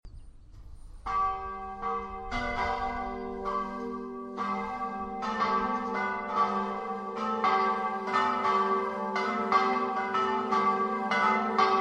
PERTEGADA, campane

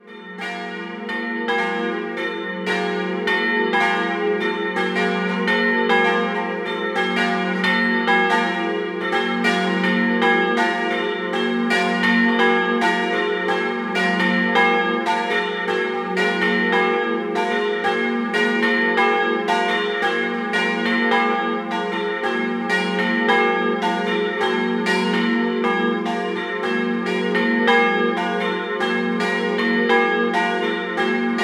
campane Doppio

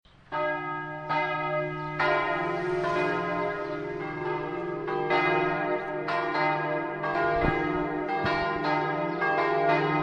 VIDULIS, campane

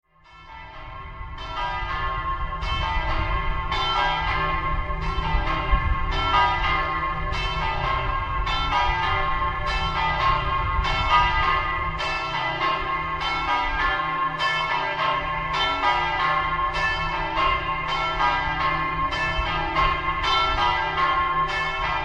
POZZECCO, campane

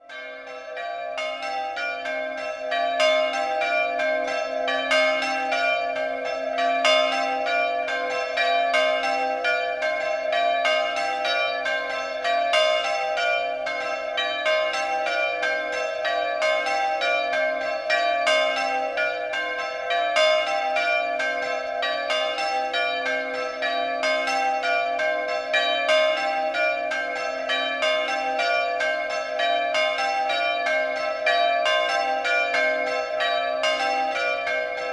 Murlis, campane

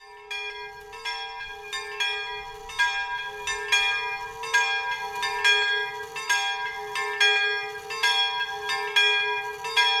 Frattina, campane

